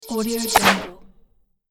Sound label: archery magic shot; sonic-boom